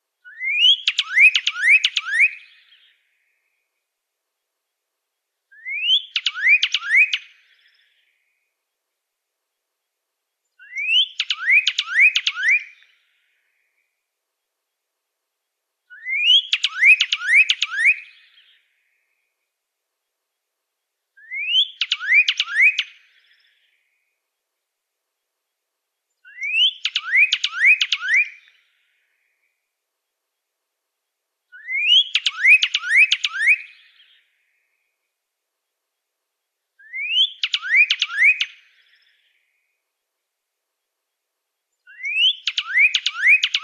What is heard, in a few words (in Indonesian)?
suara kicauan burung pyrrhuloxia